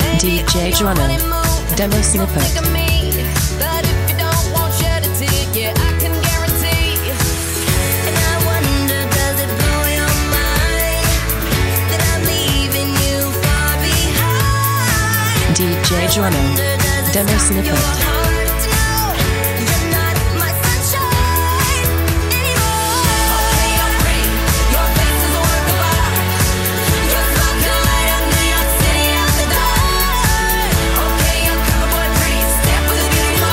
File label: 125 bpm; Redrum